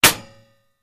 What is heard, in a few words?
Bullet Impacts Metal